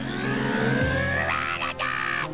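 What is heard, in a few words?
rattata, Pokemon crys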